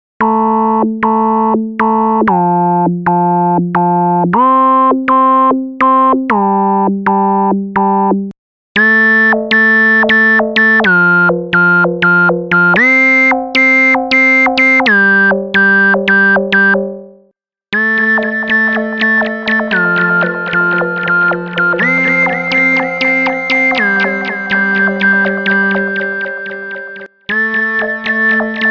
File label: minimoog